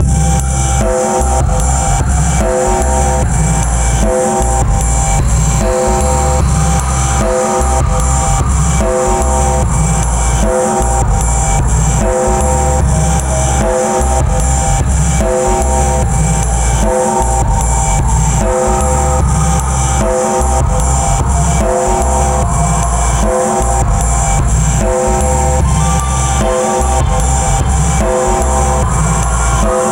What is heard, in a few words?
Music Move Loop; Instinct